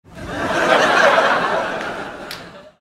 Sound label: short laugh ehe